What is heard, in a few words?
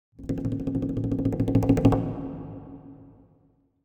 Drums Percussion Danger - Fast Drumming On A Medium Percussion; Hollywood Edge; sound designer's toolkit v2